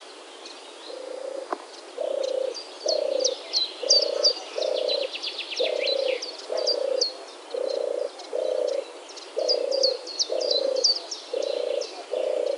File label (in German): turteltaube, vogelstimmen; johannes buhl